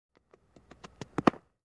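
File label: baseball sounds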